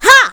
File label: attack